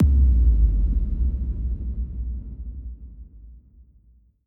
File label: Imp